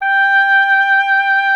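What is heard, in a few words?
oboe, wind; Miroslav Vitous - Solo Instruments VOL-2; Solo lnst. II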